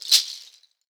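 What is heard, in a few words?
shaker, Synclavier Percussion, World & Orchestral; Synclavier Percussion 2; ILIO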